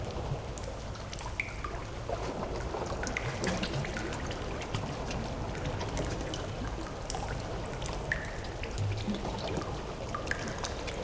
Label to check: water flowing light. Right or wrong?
Right